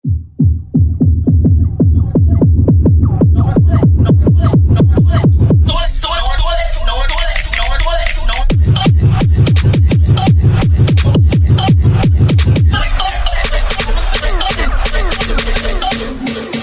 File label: toilet car type beat; gmod